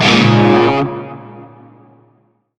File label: Shawzin Yo Chord; Warframe; Narmer Shawzin